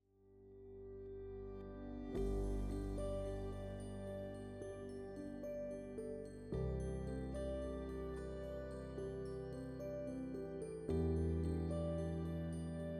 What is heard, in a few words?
BGM Amazon